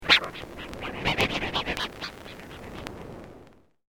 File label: Retro Vinyl Scratch It Up; Dj Sound Effects